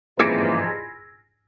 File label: piano hit